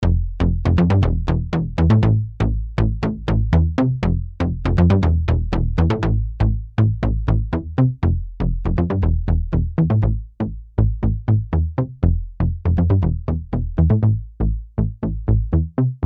KARP SEQ

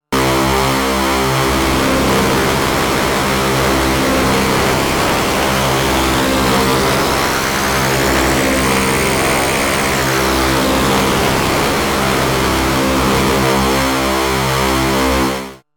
Waverazor Dual Oscillator X Tune; MOK

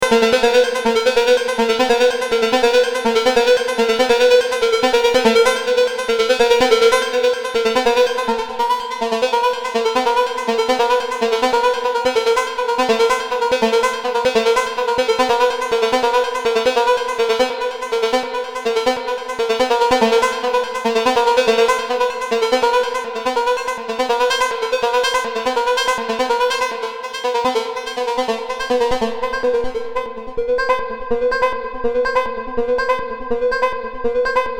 A-111 Hardsync + Trautonium + Hall

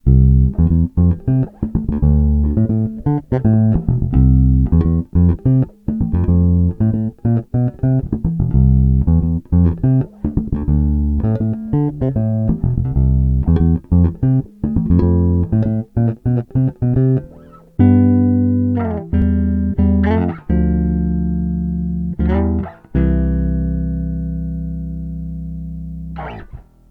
Bridge finger

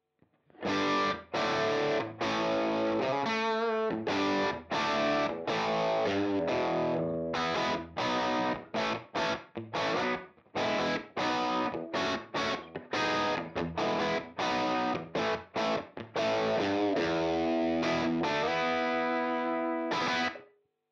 Ruby 63 Norm Silver Room Boost

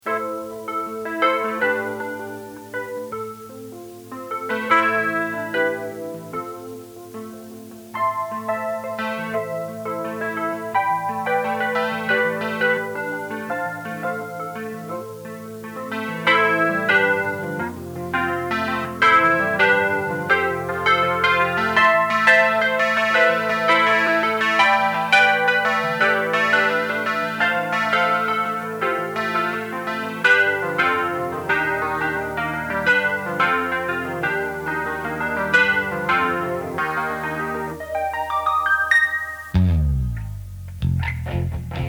Wurlitzer 200A Intro; 1983

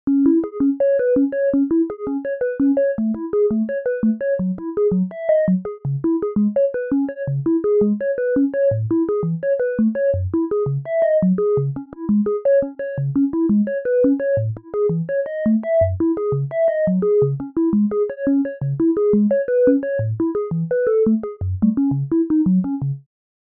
arpeggio

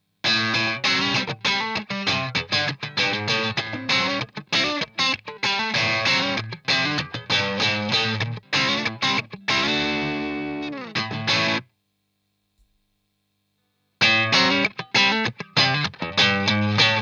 Screamer CH1 9 Tone 3 Gain Riff; JHS

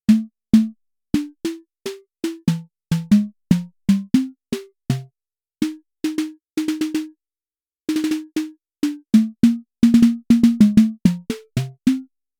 Snare

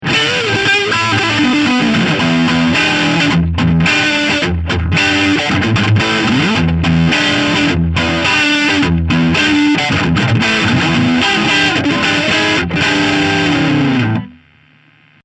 Pentode Neck PU

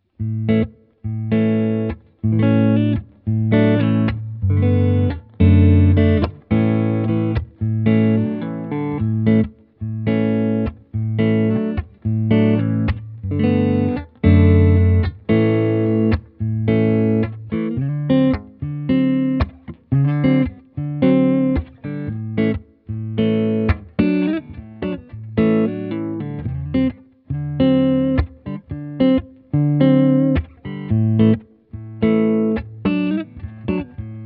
Blackstar St. James Comb Mic Clean

